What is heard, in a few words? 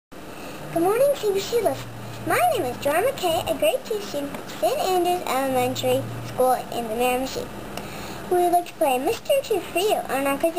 kazoo entry; cbc